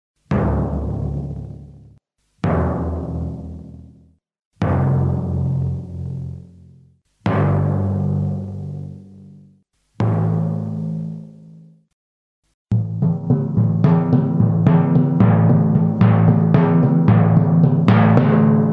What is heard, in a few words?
timpani